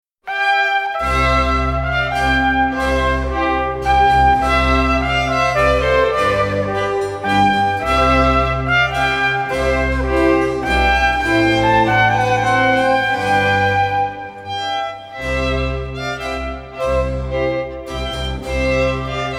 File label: trumpet air